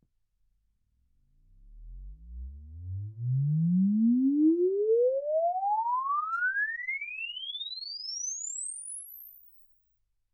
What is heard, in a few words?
exp swept sinus 10Hz 24kHz